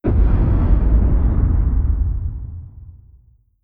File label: distance perdition beam fire; apocalypse